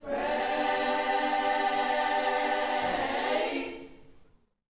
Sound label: YOUTH CH PHRASE, Vocal Planet Gospel